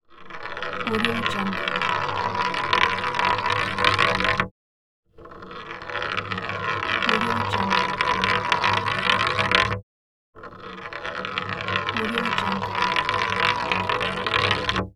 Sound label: small marble roll and jump off